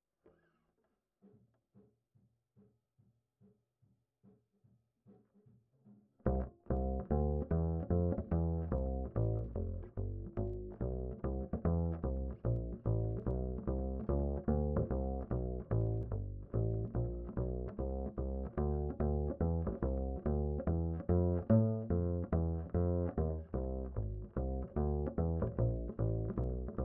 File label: Bass Line